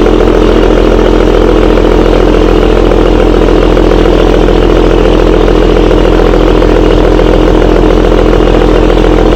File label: motor